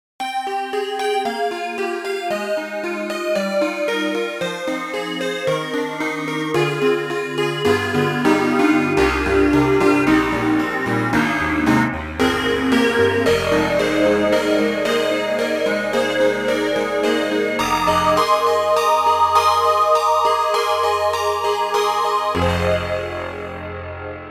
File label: Almost a Piano; Keys; Cakewalk; Rapture Expansion Pack 2; FG Almost a Piano